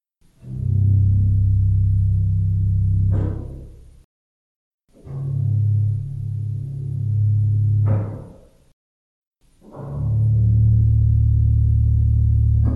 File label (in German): Pedal Violon 16, orgel